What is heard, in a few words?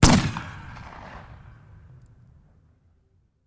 Bullet Impact; Sound Ideas; Guns